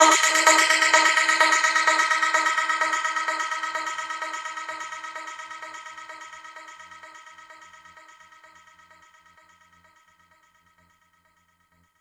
Reverb Athmospheres, FX Vocals; Vengeance Samples

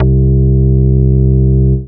Basses C; Vengeance Samples

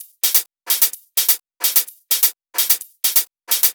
Drumloops 128BPM

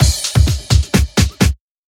Minimal Fills; Minimal Loops

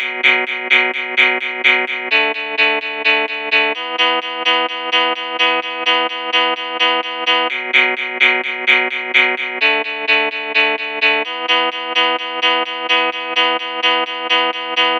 Guitar, 128 BPM; Vengeance Samples; VTDS2 Song Kits

